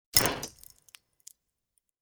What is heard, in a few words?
Camera Flash Pot